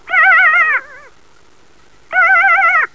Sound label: Stercorarius pomarinus